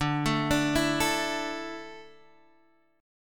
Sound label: D Suspended 2nd D, down, Guitar Open D